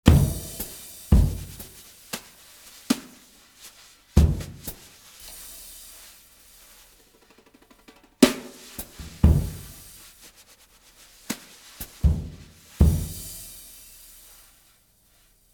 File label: DRUM SOLO